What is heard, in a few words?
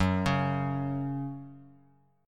F sharp 5th F sharp; arpeggiated; JGuitar